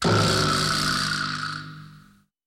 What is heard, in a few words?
Kihada Taiko